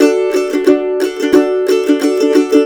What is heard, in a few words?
COUNTRY